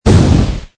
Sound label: Fireball, Short blast, burst of fire.; Explosions; Lynne Music